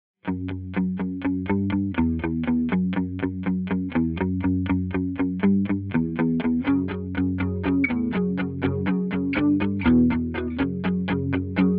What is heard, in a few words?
Fender Jazzmaster